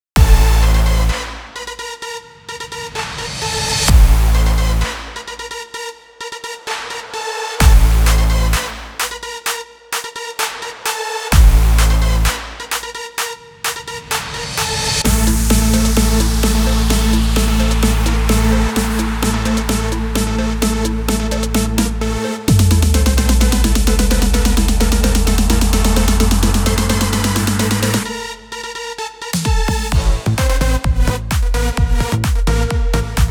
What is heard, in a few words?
beat